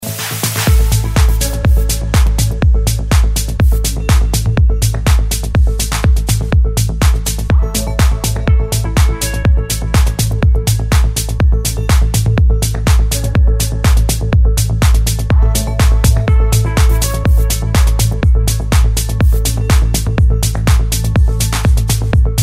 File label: progressive melodic house